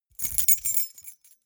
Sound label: key ring small rattle air hard